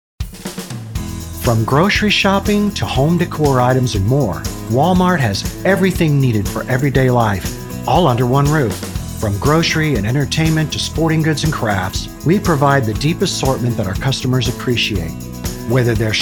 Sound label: CORPORATE MIX